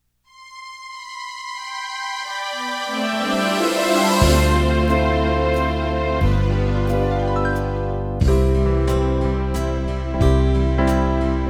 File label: Waltz